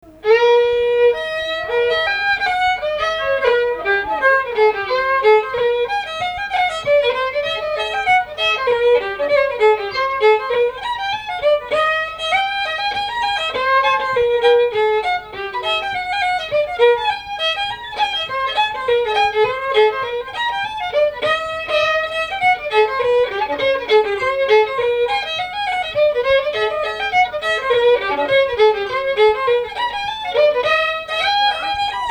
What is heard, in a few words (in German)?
reel E dur